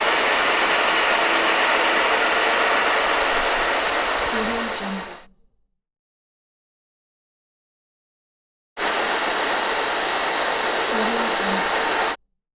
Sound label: steam